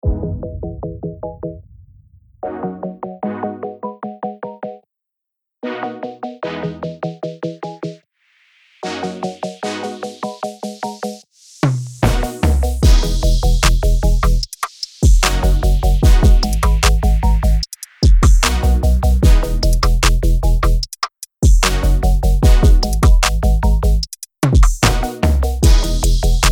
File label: urban chill